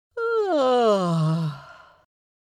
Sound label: Witch fantasy vocalization yawn